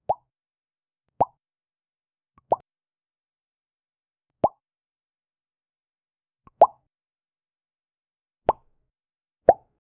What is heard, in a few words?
a soft rounded bubbly pop, bubbles